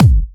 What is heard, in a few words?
Hard Kick; Vengeance Samples